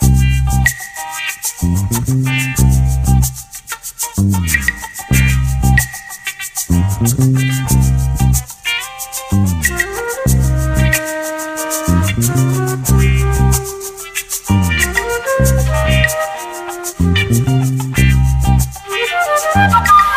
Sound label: flute sometimes fonky, Loops Phonky